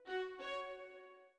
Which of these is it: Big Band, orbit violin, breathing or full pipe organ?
orbit violin